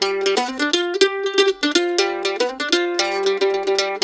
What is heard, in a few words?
LICK, Blues Guitar